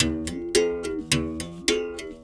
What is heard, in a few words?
Tar, World Percussion